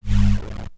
zap

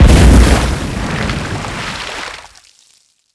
explosion grenade water; projectiles; Max Payne

